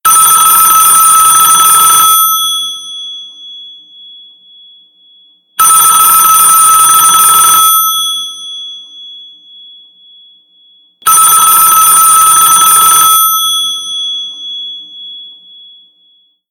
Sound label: ringtone